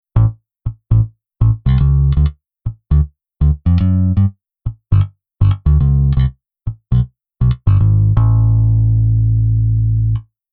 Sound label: Bass Stacc